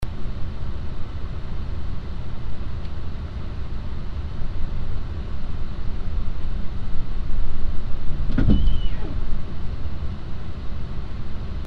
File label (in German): Streifkollision; Experimentelle Untersuchungen zur akustischen Wahrnehmung von Kleinkollisionen